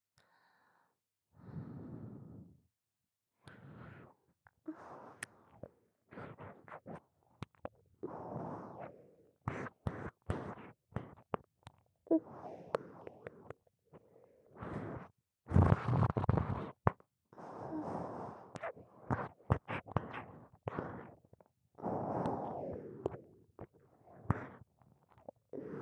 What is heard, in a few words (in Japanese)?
右耳舐め